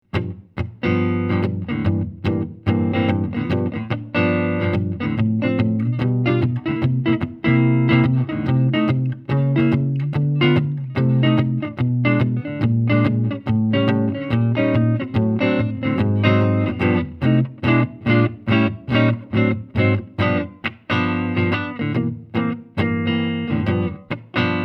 Jazz

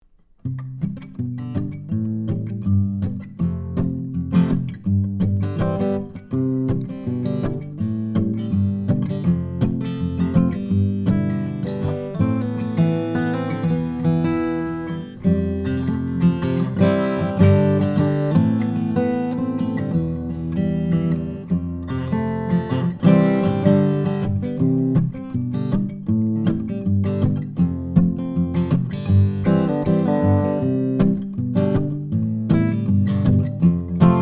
Fingerpicking; AMI V78M

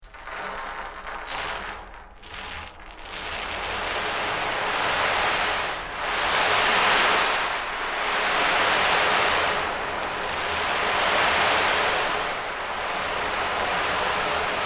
Rain

